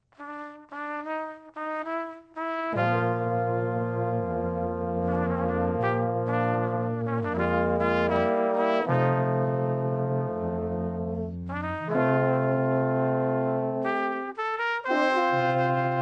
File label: Brass